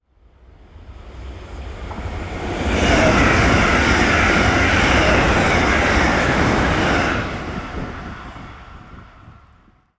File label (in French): trains diesel et electriques; sonotheque